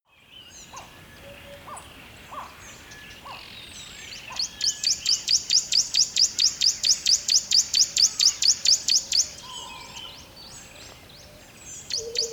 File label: river dawn bird close